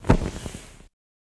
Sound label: Flame Ignition